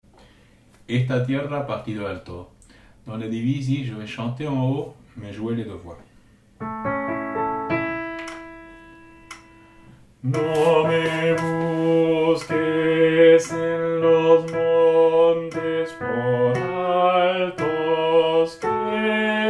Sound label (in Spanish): Esta tierra Alto